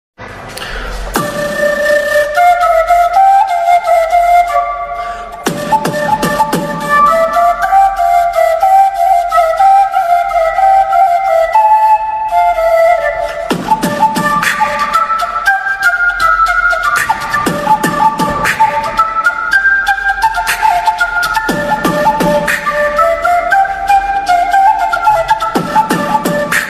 flute beatbox ringtone flute ringtone romantic ringtone